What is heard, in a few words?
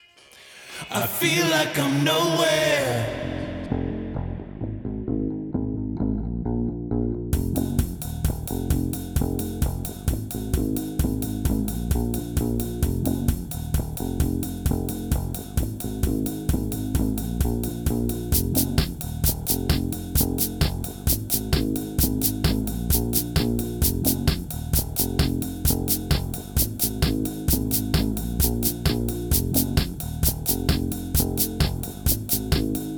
Euro Trash